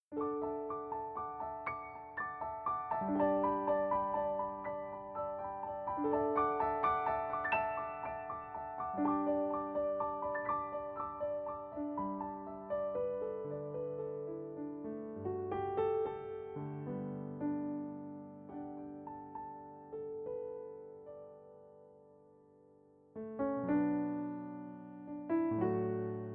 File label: Piano Solo